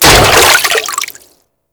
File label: Frame Step Water; Warframe; Vay Hek